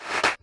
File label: CLAP HOU, Dance Mega Drums; Best Service